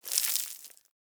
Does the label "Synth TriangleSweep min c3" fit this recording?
No